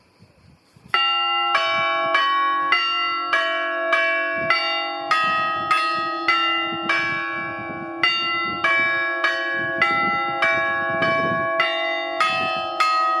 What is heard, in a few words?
Glockenspiel; Mugnana